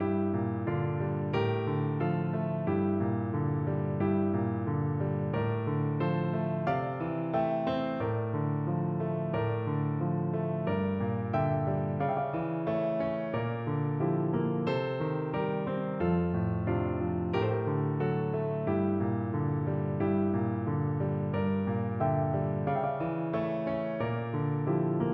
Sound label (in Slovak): paslo dievca pavy piano; noty, piano, flauta; Eugen Suchoň; Pieseň bola skomponovaná pre operu Krútňava od Eugena Suchoňa v 40 rokoch 20. storočia; Perinbaba